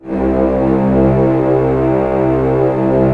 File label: ORC C1 FF, Orchestra; Sound On Sound - Peter Siedleczek's Orchestra